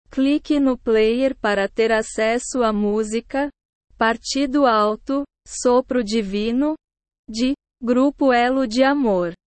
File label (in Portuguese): partido alto